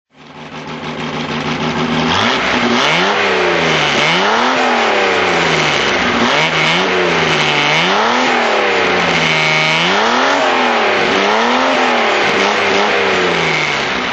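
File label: austin healey 3000 mk1 sidepipe throttle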